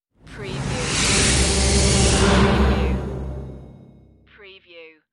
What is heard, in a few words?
Dark Production Whoosh